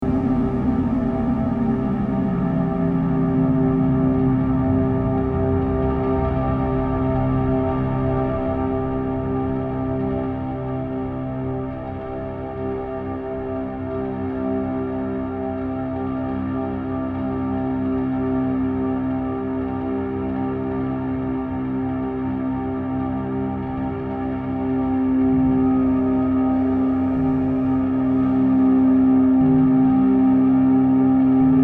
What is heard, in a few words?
amb